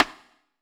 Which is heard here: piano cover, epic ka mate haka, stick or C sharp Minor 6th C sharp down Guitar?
stick